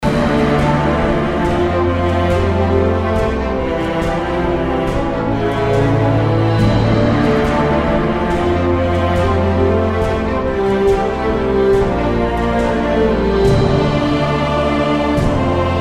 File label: Victory; Lords of Xulima; LOX Victory